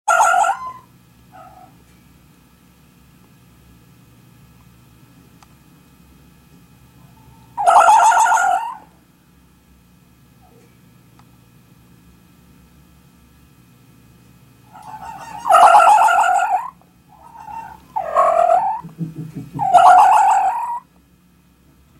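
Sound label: sound of a fox communicating; lisy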